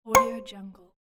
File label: notify pop alert bell